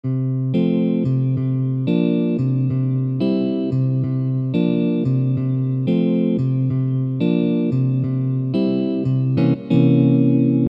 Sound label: pedal intro